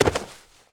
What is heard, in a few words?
jump end; Mount&Blade Warband